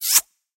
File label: kiss; Wazdan